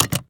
car seat belt click lock; transportation; sound effects; sound effects one

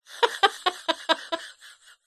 human female laugh slightly wheezy middle aged; zapsplat

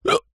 human male hiccup single

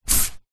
leisure playing card slide on table; zapsplat

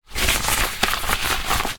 glove rubber movement; sound effects one

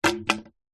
wood stick thick med heavy drop concrete; foley

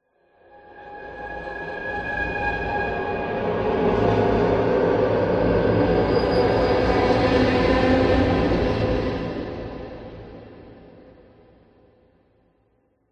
horror metal scrape processed evil scray

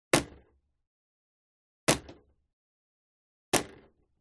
WEAPONS BOW & ARROW Impact Sweetener Wood Hard; smartsound

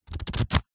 household book crossword thin pages flick through

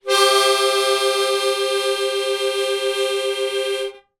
musical harmonica single

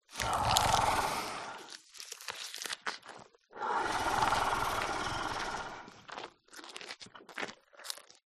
dinosaur herbivore eating plant; animals

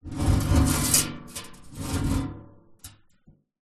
metal bath light movement concrete resonate loose; zapsplat

